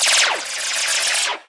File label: Laser